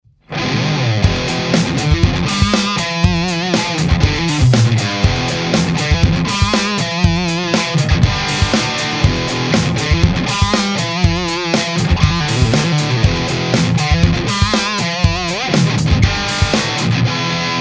mikrofon c.2 poloha c.4, Test mikrofonov na git. aparat

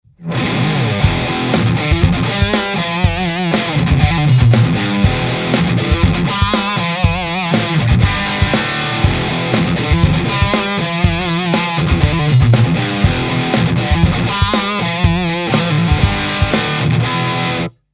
mikrofon c.2 poloha c.1, Test mikrofonov na git. aparat

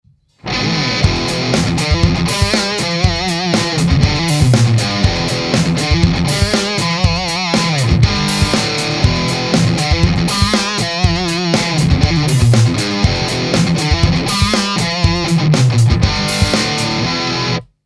mikrofon c.1 poloha c.1, Test mikrofonov na git. aparat; Treba oddelovat zvuk aparatu nazivo a na nahravkach

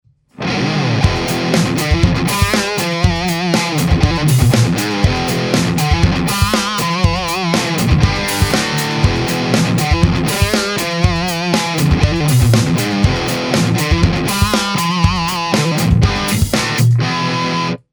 mikrofon c.3 poloha c.5, Test mikrofonov na git. aparat

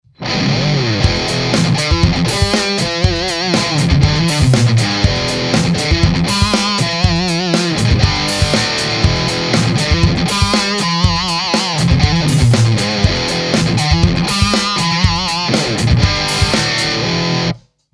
mikrofon c.1 poloha c.2, Test mikrofonov na git. aparat

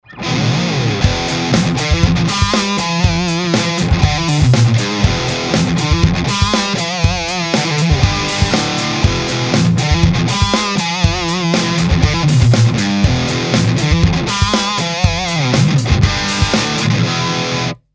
mikrofon c.2 poloha c.3, Test mikrofonov na git. aparat

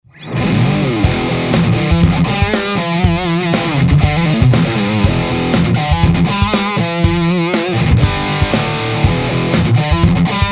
mikrofon c.1 poloha c.3, Test mikrofonov na git. aparat

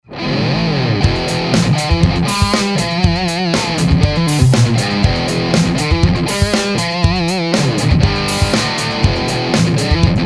mikrofon c.1 poloha c.4, Test mikrofonov na git. aparat

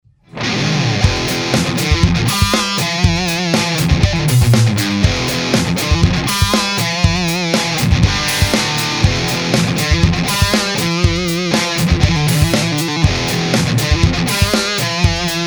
mikrofon c.2 poloha c.2, Test mikrofonov na git. aparat

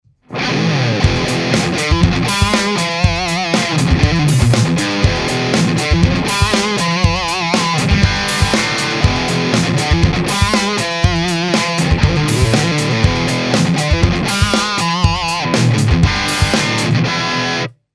mikrofon c.3 poloha c.4, Test mikrofonov na git. aparat

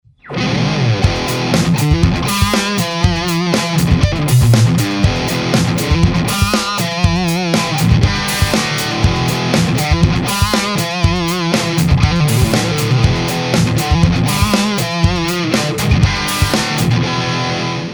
mikrofon c.2 poloha c.5, Test mikrofonov na git. aparat

